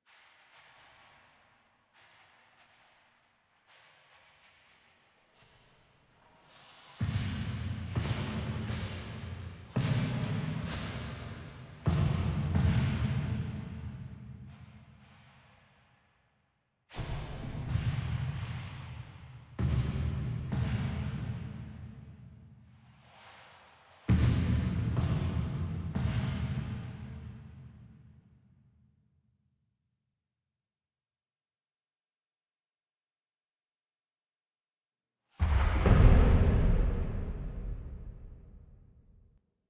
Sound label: Foley; Warframe; The New War; Finale